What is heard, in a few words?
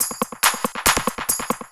hard beats 140, hard trance beats